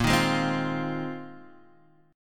A sharp 9th Suspended 4th A sharp Guitar Half Step Down